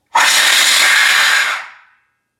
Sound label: windmill monster indoors, Indoor scream; THE WHIMSICAL WINDMILL